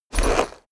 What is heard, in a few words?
horse step saddle